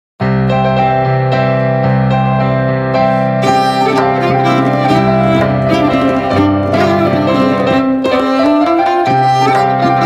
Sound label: Violin